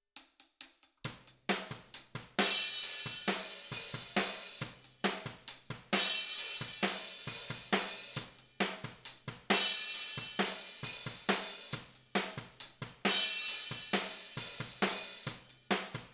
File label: Ride